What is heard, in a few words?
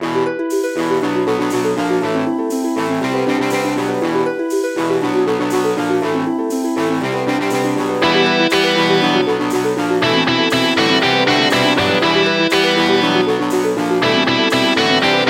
Rock & Strings Instrumental Remix